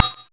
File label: throw; gemdropx